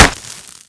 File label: wall, weapons, knife; garrysmod